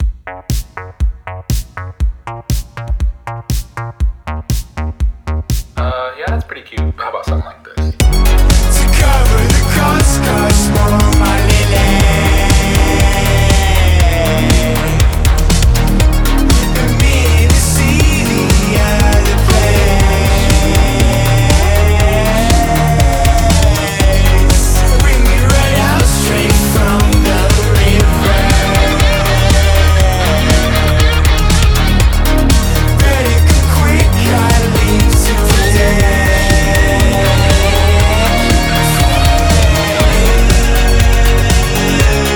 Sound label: Indie Dance, 120